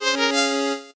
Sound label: 8bit se